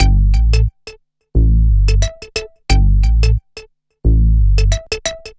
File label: SYNT, funk; USB Soundscan vol.47; AKAI; Hip-Hop & R&B